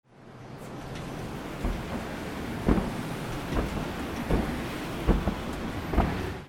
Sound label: DOORS